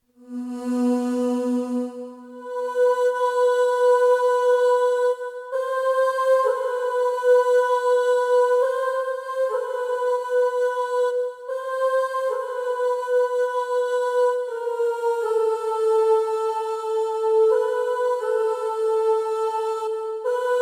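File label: Prelude E minor choir Ooh; Chopin; Prelude Emin (Rainy)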